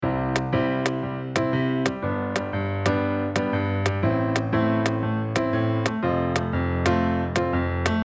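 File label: shuffle with Click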